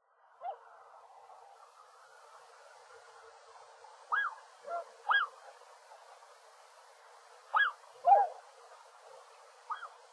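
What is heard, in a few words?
Tundra Swan; koe; kohakucho; kaga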